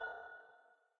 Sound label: Main Menu Mouse Off; bejeweled 2 deluxe